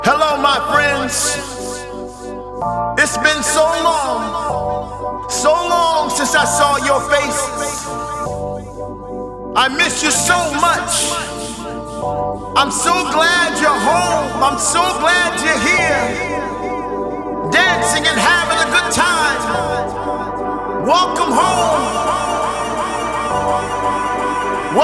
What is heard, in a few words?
Acappella